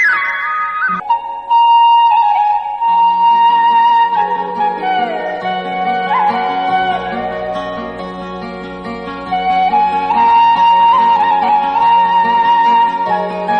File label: Flute